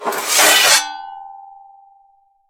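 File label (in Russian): резкий лязг металлическим предметом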